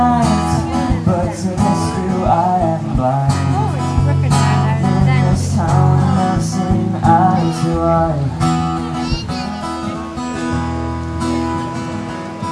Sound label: music in the rain; woodworks